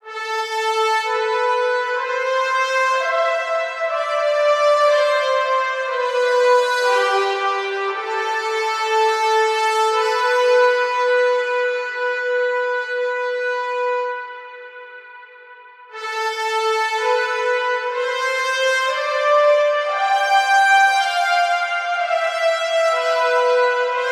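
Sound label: Horns - A minor 120BPM; Construction Kits; STEMS; Ghosthack; SkyRealm; Kit 05 - Heart Of Fire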